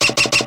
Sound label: clown spider step, Footsteps